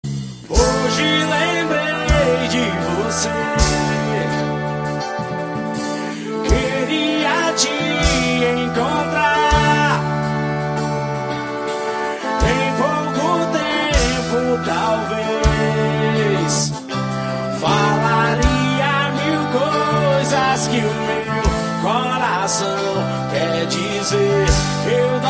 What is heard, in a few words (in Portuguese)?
forró